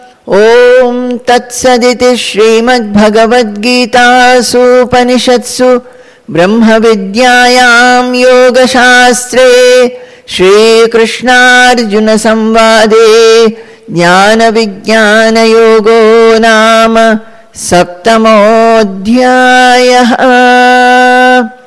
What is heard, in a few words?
Ending Chanting; chapter 7 jnana vijnana yoga; bhagavad gita; conclusion